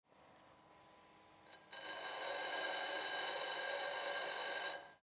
Schleifbock

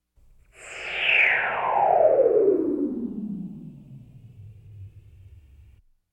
Science-Fiction Runterbeamen

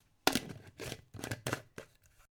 kueche verschluss

